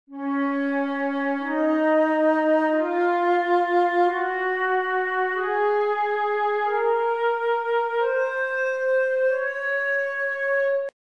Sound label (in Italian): do diesis maggiore